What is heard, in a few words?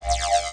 menu; Messiah; INTERFACE